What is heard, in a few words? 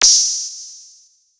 Fx Vox; Equipped Music; Loungin House